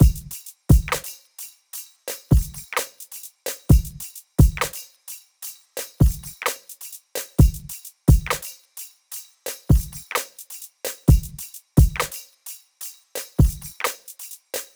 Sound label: 130 Drums Full